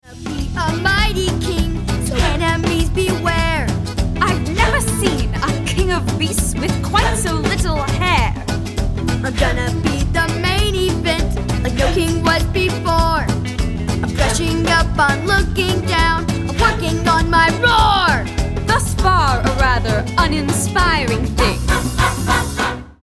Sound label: Zazu Vocals